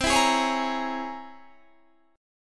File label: C 7th Sharp 5th Flat 9th C